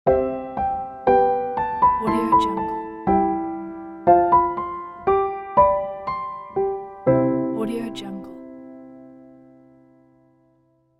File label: piano musical fairytale transition